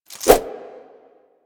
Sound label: Nunchaku Start Whoosh; Warframe; Tenno